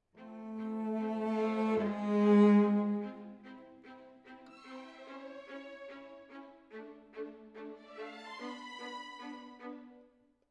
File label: Cello Solo